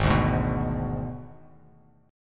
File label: G sharp Diminished G sharp; 4,5,6,4,x,x,x,x